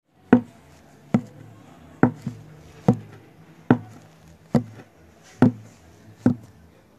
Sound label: Steps In Wood Floor